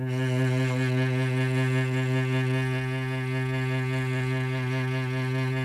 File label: CHAMB CELLO; Sonic Reality; Vintage Timetraveler